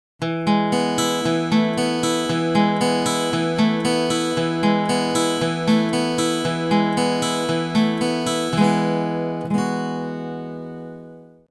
tonic; fretjam

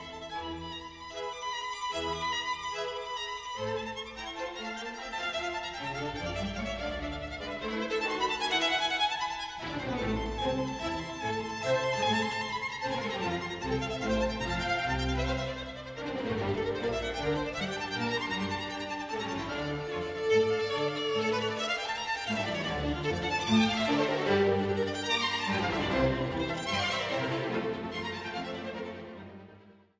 violin concerto d minor; mendelssohn; mvt1

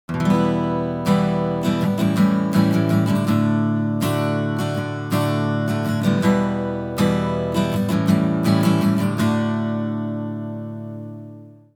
maj prog; fretjam

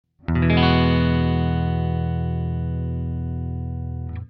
eadd9 open; fretjam

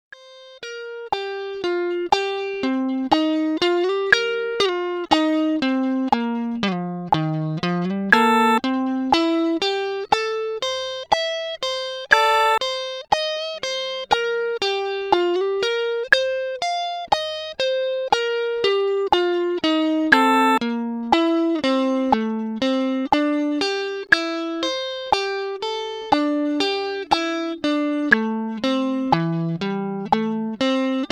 pentatonic change; fretjam

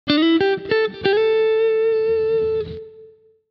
slide in; fretjam

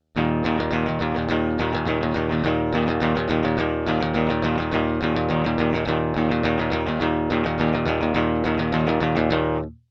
metal strum

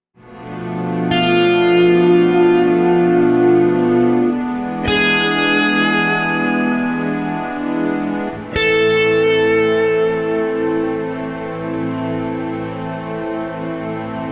minor 251 one tone per chord; fretjam

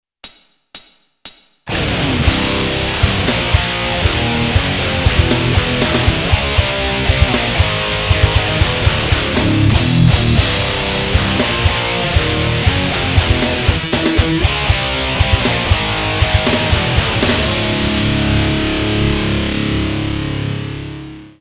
death metal; fretjam

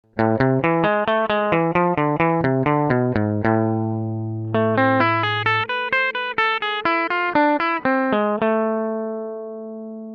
harmonic minor intervals; fretjam

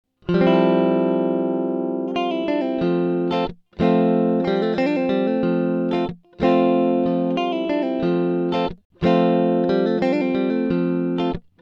chord phrase; fretjam

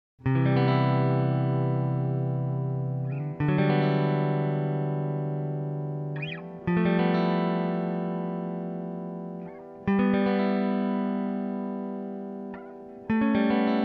c maj7 float; fretjam

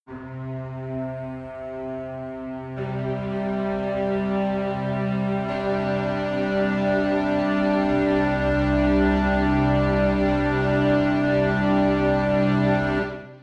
csus2; fretjam

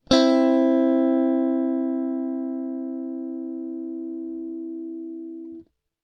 double stop; fretjam

